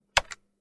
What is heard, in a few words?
button click